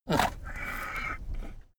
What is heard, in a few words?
donkey grunt snort